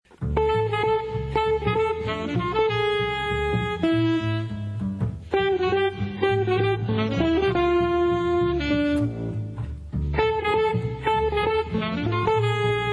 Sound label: tune low